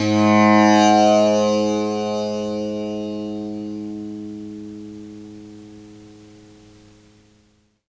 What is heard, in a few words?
Indian Percussion Tabla; Mango Loops